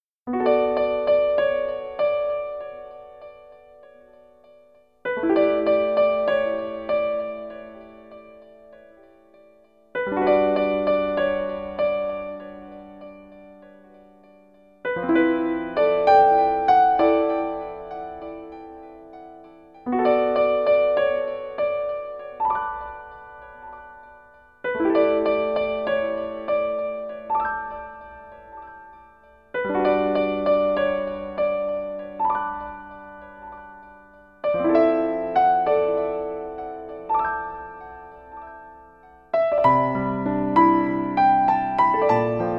Piano Arrange Version; solfege vocal collection

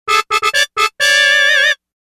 Party Ball Jingle; Super Smash Bros Brawl

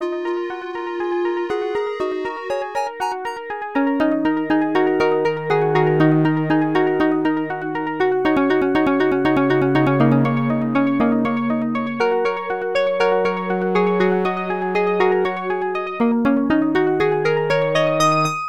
Game Clear; dragon s eye plus shanghai 3 genesis

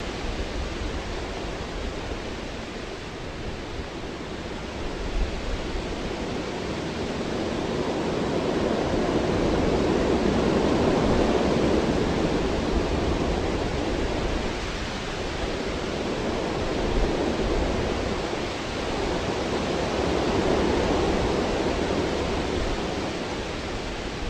Grass; sid meier s civilization revolution 2 2015 vita

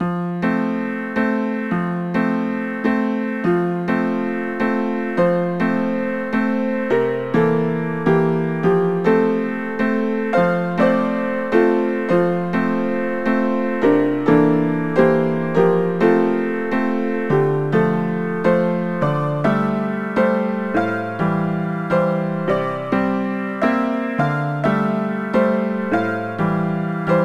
Dance Walzer Slow; Knigge Spielend zum guten Benehmen; NDS

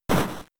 Comet Punch 1hit; Pokemon; Gen 3; attack moves; RSE

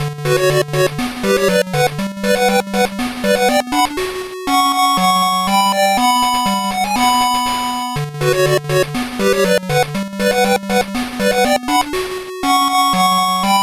Game Victory; Utatte Pico Minna de Karaoke; Yuuki 100%

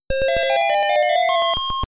Round Clear Music

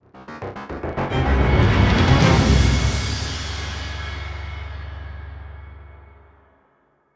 Final Fight Transition; Operation Blood Storm; Yard; Call of Duty Infinite Warfare

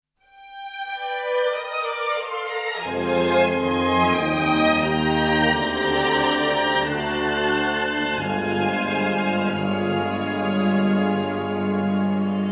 Mission Failed; Toukiden The Age of Demons

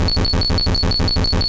Thunderbolt

